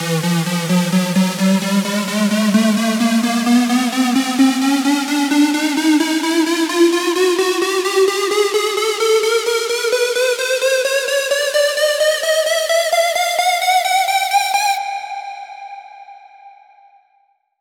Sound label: Square E Pulse